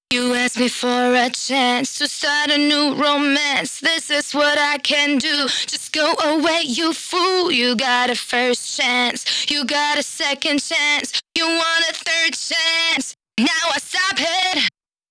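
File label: Female Vocals Verse 2 DRY, 128 BPM; Vengeance Samples; VTDS2 Song Kits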